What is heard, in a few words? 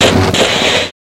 distillery compacted radio, music